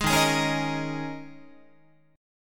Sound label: Eb 7th Suspended 4th Eb, Guitar; 11,11,11,11,14,14; 4A,4E,5A,5D,5G#,6C#; strummed